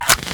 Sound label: Flare Use; 1916 der unbekannte krieg gamerip